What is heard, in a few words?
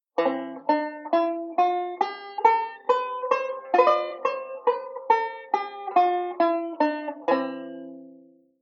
C G7 Chord Change; Beyond Chord Melody Volume II